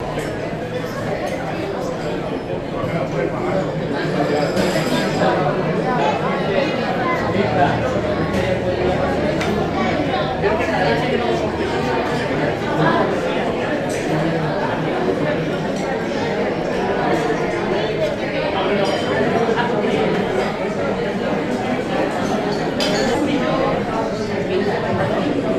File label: Busy Restaurant